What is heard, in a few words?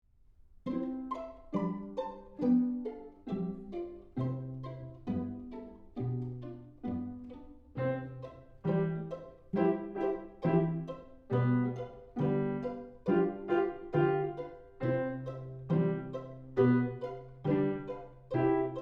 The Arguing Cuckoos with piano